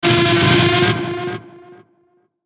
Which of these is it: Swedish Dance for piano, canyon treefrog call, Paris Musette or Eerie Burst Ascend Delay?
Eerie Burst Ascend Delay